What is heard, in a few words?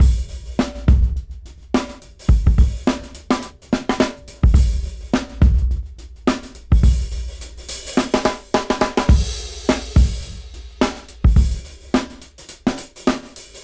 Bumpy 106 BPM; AMG - Keith LeBlanc Phat Butt Jams